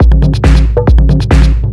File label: drum, 138, drum-loops, tribal, Techno; Techno ID; tribal-tune